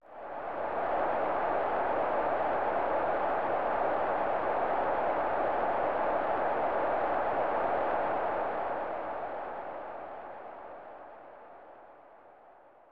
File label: Crowd Ambience; Manchester United Championship Soccer; SNES